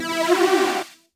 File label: Synth Sound; Vengeance Samples; VEE Synth Shots